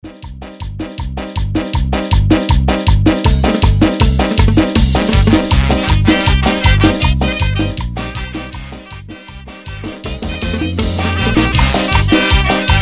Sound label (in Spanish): Banda Bandita, Bpm 161